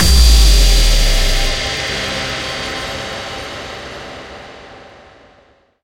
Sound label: stinger; Mirror's Edge (2008)